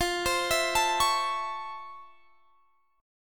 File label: F Major 7th Flat 6th, F, guitar